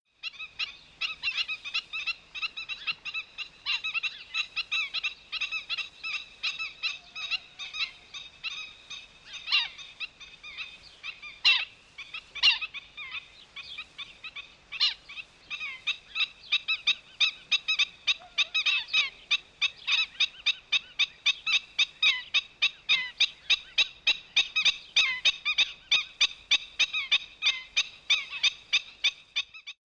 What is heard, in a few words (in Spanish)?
Cigüeñuela común Himantopus himantopus